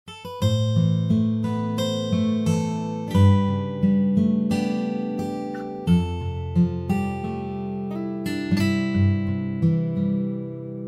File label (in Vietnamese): acoustic guitar solo nhac nen